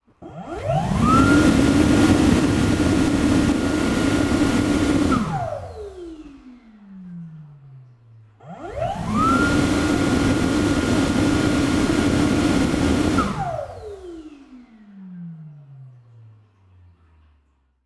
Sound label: dyson airblade hand dryer